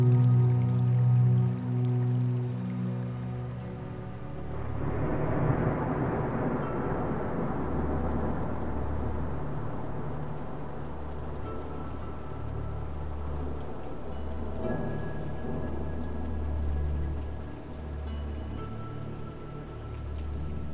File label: Rainfall Gentle Rain